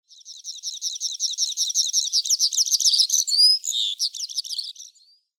Steppenpieper Anthus godlewskii; Vogelstimmen

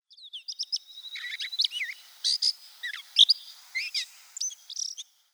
Katzenvogel Dumetella carolinensis; Vogelstimmen

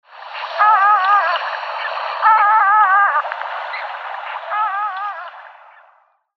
Brillenente Melanitta perspicillata; Vogelstimmen

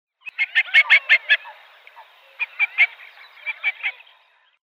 Tafelente Aythya ferina, Vogelstimmen

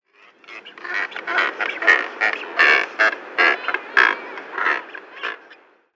Kraehenscharbe Phalacrocorax aristotelis; Vogelstimmen

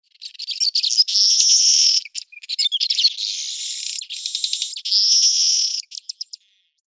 Dorfweber Ploceus cucullatus; Vogelstimmen

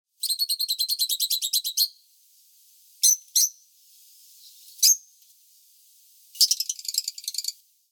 Rosenkoepfchen Agapornis roseicollis

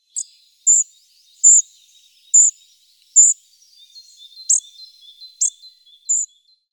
Grauschnaepper Muscicapa striata; Vogelstimmen

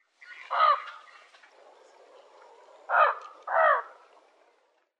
Afrikanischer Loeffler Platalea alba; Vogelstimmen

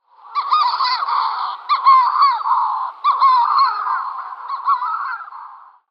Schwarzschnabel-Sturmtaucher Atlantiksturmtaucher Puffinus puffinus; Vogelstimmen

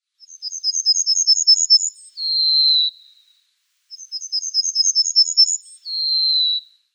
Fichtenammer Emberiza leucocephalos; Vogelstimmen

